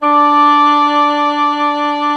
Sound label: WINDSEC D4, WIND SECTION; Greytsounds VOL-1